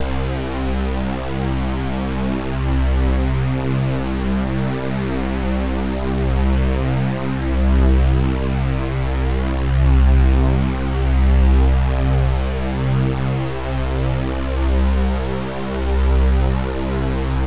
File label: Jupiter-6 DRONE Lifted C; Synth; One Shots; Cymatics; Fantasy Synth Sample Pack